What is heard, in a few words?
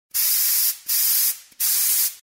spray; gmod